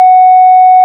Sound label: ORG, Farfisa